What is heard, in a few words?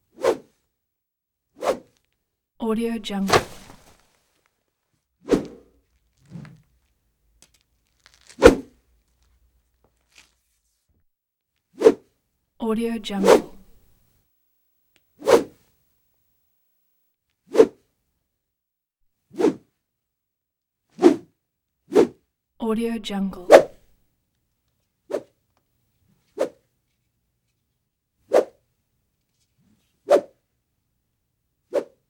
designed whoosh whooshes axes; transitions and movement